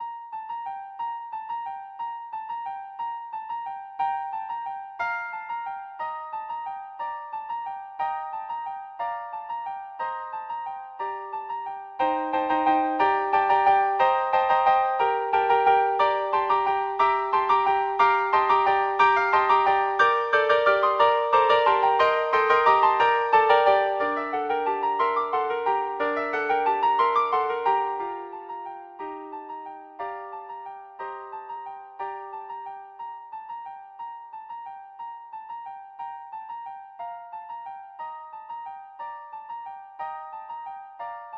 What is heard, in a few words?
flute quartet